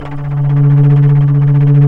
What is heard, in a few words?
FLT, Bass Flute, Bs Flutter